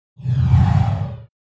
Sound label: Swipe